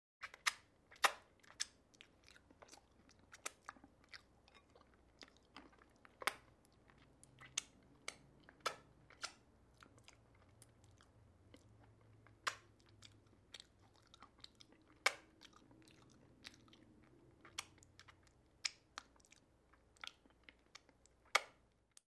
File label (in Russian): zvuk zhevanija edy i oblizyvanija palcev